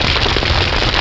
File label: fire loop; Fire 4